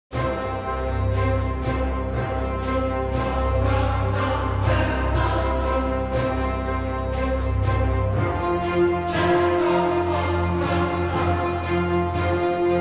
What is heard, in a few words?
choir